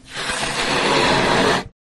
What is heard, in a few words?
Blow Up Balloon, Blowing Up a Small Birthday Balloon; Mike Koenig